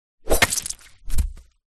warfare sword swipe slash head chop off fall to ground